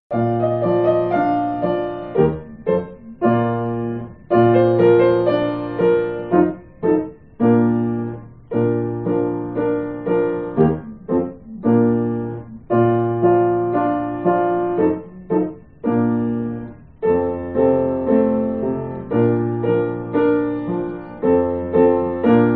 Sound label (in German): fröhliche weihnacht, trompete